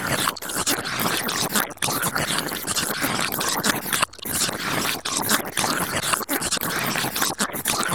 Gooberball Idle Loop; Lurker; Evolve; Gorgon

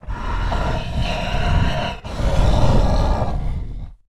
Stealth Breath Idle; Evolve; Goliath

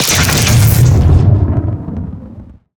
Banshee Missile Flesh Impact; Evolve; Elder Kraken

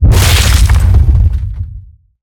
Mortar Cannon Impact; Evolve; Behemoth

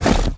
Tongue Grab Pull Back; Evolve; Behemoth

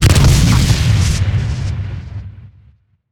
Banshee Missile Impact; Evolve; Elder Kraken

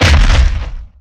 Impact; Evolve; Goliath

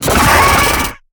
Pain Light; Evolve; Gorgon; Lurker

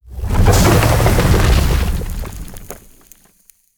Seismic Blast Staircase; Evolve; Behemoth

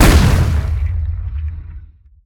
Seismic Blast Fist; Evolve; Behemoth

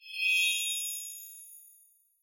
Monster Shield Bar; Evolve

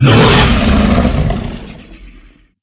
Harpooned; Evolve; Behemoth

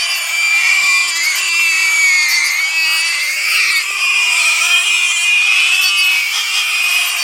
Ability Spider Trap Persue Loop; Lurker; Evolve; Gorgon

